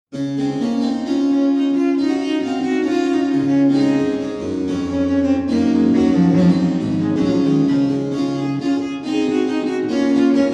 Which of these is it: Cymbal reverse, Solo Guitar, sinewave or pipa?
pipa